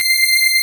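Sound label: PLS strings C6; AKAI S6000 CD-ROM - Volume 1; SAMPLE WAVE MIXING 1; ANA SYN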